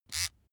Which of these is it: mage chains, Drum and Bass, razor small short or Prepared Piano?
razor small short